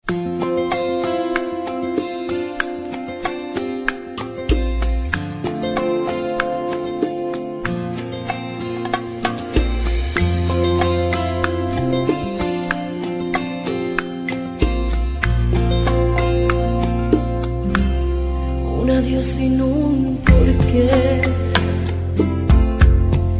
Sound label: Ballroom Rumba